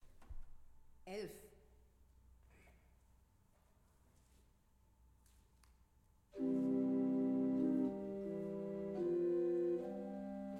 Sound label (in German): Weigle, gebrauchtorgel